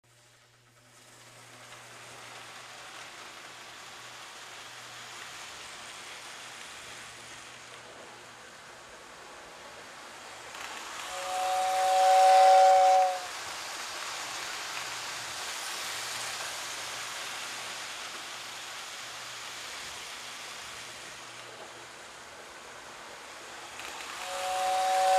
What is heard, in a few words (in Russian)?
zvuk detskoj zheleznoj dorogi